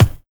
HIP DNC K; Drums In Your FACE!; BONUSKIK

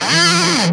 Fuzz Tones Up; Mo' Phatt; Digital Sound Factory

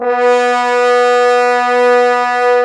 F horns, brass; AKAI S-Series CD-ROM Sound Library VOL-8

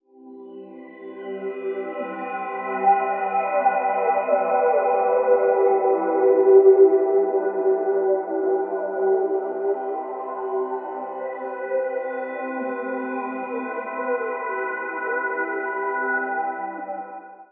Peaceful CMaj7, Light; Zero-G Ambiosis

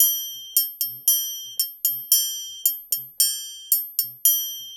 LOOP, Percussion; InVision Interactive; Percussion Slam; ITCHY

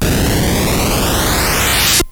Die

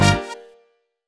disco backing; Best Service ProSamples vol.48 - Disco Fever; AKAI

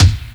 TOM, TOMS KIT, Ragga & Dub Reggae

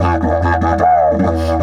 150, DIDGE RIFFS

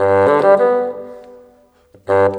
Bassoon, Orchestral, Rock Pop; Sonic Foundry (Sony Creative Software); Orchestral Series VOL-4 - Rock and Pop

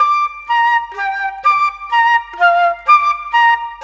Flute, Orchestral Series Rock and Pop

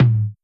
Toms, Elektron MachineDrum SPS1 MKII

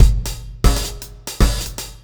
Beat, Drums, Eclectic Electronica

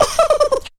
Laugh; Zero-G Jungle Warfare 1, 2 & 3

